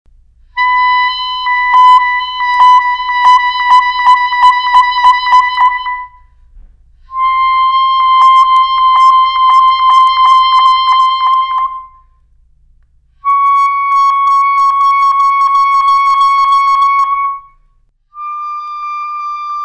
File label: Clarinet